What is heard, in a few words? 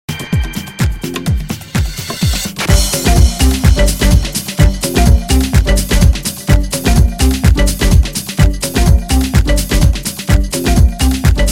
sax mix